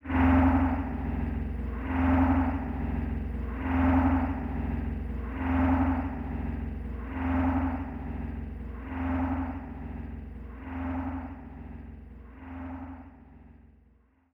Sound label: move